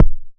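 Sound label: kick, ARP 2600 Drums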